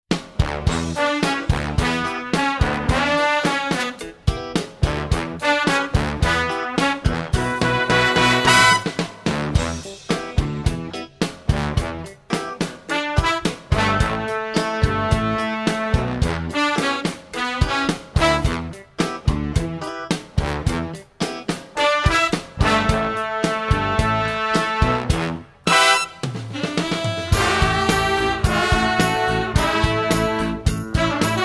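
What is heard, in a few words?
shadow free accompaniment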